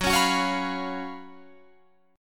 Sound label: Gb Major 7th Suspended 4th Gb Guitar Open F